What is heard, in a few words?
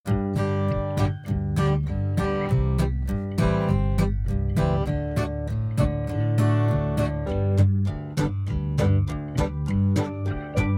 Glockenspiel Blue Uku